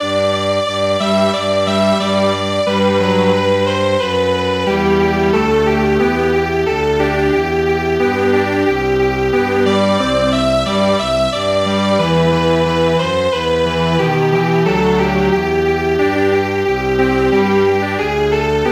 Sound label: Strings Quartet, traditional; Long I gaze across the snow